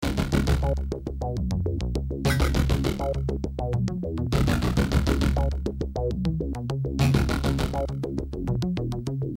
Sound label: ringtone